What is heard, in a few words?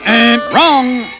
wrong